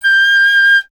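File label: flute piccolo G; Miroslav Vitous - Solo Instruments VOL-2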